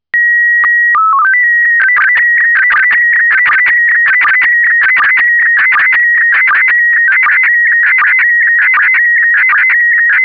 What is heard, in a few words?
SSTV PD180, Hobby Radio